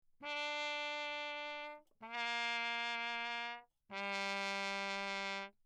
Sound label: TRP MUTE Bach low; D Wick; Perinétventiltrompete; Mutes